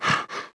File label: Orc Captain Idle